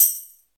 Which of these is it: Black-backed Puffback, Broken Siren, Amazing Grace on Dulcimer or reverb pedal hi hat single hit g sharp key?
reverb pedal hi hat single hit g sharp key